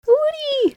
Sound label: whee